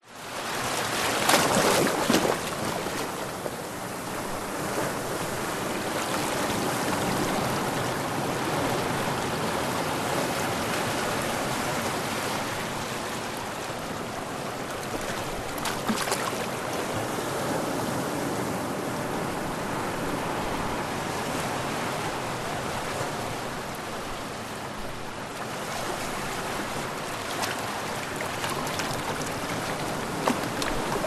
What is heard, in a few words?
nature ocean waves lap onto rocks shallow rock pool surf close by; zapsplat